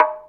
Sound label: DR. LOOP, DRUMS; X-Static Goldmine 3